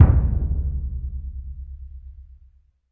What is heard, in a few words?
big burundi, African Burundi Drums